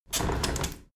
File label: window handle squeak turn open heavy; objekte; fenster